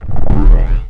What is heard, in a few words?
health; quake2